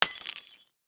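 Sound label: glass; Smeshariki Cartoon Studio; Steklo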